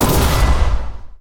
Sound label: laser; ragemap2019